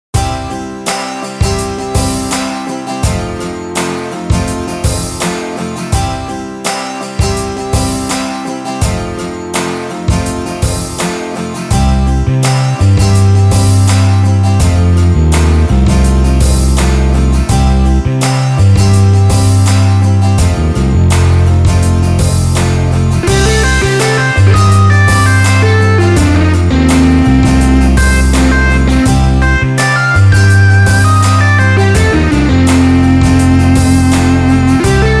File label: Country Bumpkin Blues; Doinaka ch5